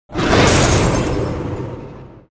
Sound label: screen broken; One Shot Fishing